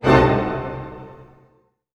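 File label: C diminished pianissimo, orchestra hits; Sound On Sound - Peter Siedleczek's Orchestra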